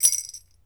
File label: BEL RATTL, African Percussion; E-MU Producer Series Vol. 7 – Old World Instruments